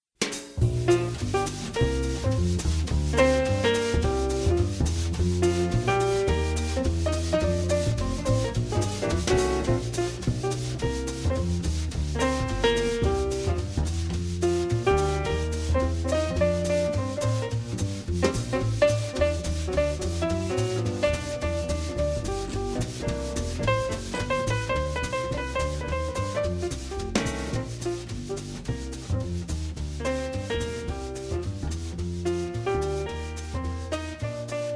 Jazz

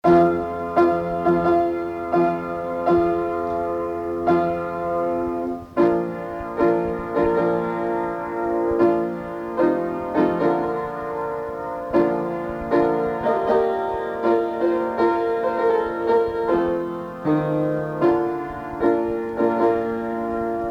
Wedding March piano; Wagner

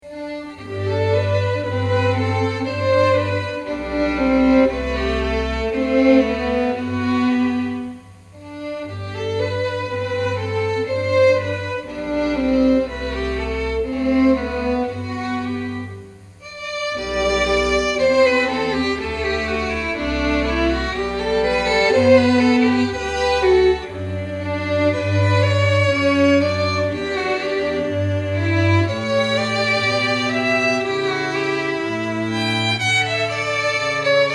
Quartet; Bach; Wachet Auf; Classical String Quartet

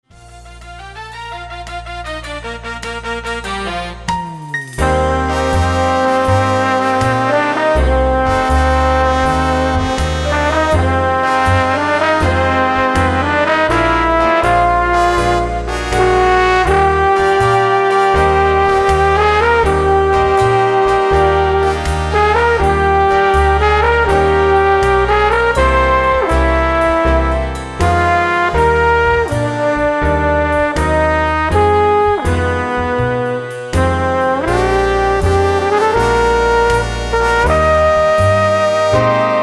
trombone; Impressionist; Joey Vincent

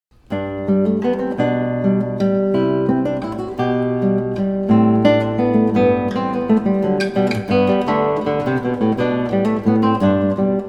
Bach Minuet, Classical

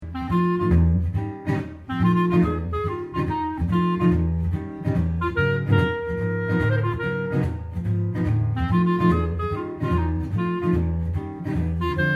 Jazz Duo

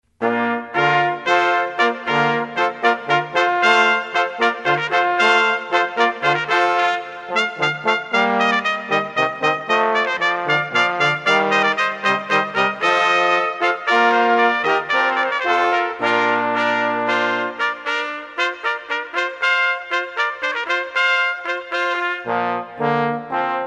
brass quartet; Allegro Maestoso

